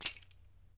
BEL RATTL, African Perc; E-MU Producer Series Vol. 7 – Old World Instruments

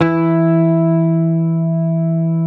Hamer 12, SUS, E3, Bass; Sampleheads; Will Lee Bass Library

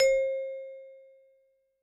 vibe; Yamaha DTXpress-II

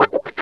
Wah Scale; Mo' Phatt; Digital Sound Factory Mo' Phatt

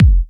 Kick, Kickdrum; Equipped Music; Loungin House

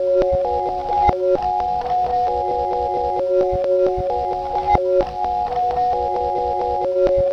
Arpeggio Line, Synths; Sonic Foundry (Sony Creative Software); Modular Electronica

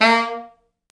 A#2, Pop Brass; Best Service ProSamples vol.19; AKAI

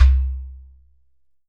GHATAM

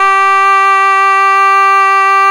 G 1, schluetter; SoundBytes - Early Patches

